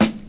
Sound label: good snare one shot g sharp key; snare drum one shots